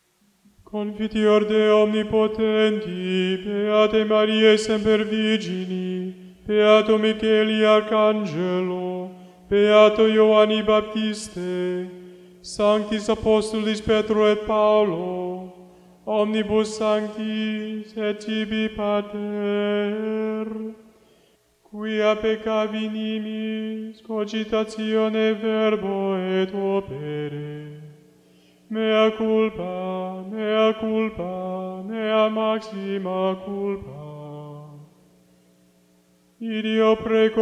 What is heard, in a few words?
Sung Confiteor